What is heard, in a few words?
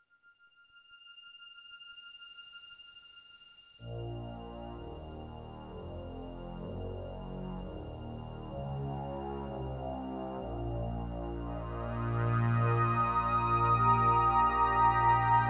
Shower of Rain